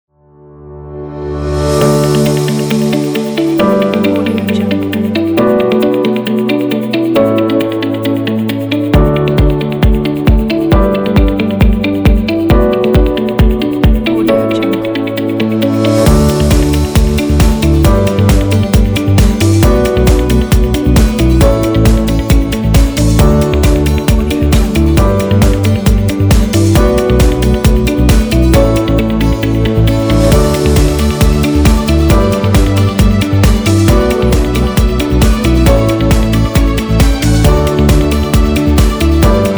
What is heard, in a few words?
corporate upbeat